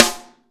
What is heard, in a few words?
snare dry; Real Mega Drums